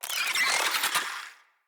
Infested Ashen Vocal Attack Death

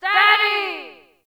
Ambient Campsite Yells; Warframe; Tau

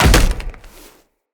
Land Heavy Ice, Footsteps; Characters; Tenno

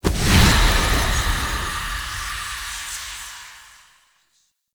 Stinger

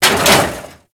Step Metal Debris; Enemies; Steps

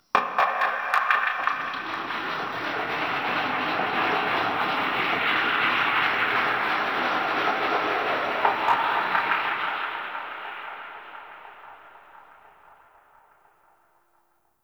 Exterior Space Rings Textures; Warframe; RailJack; Ambience

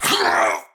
Attack Effort, Battle; Warframe; Tau; Dax; Knight

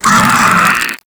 Desert Skate Vocal Death; Warframe

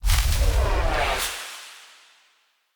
Wyld Blind Cast; Fey; Saboteur

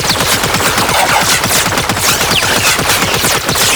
Trapper Drone Fire Loop; Warframe; Tau; Prime Grineer

